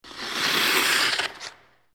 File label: ice skating stops